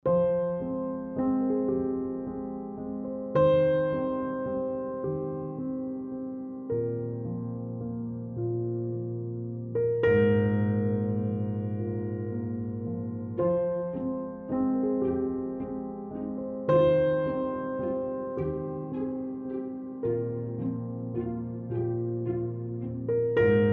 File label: Piano mood; cinematic music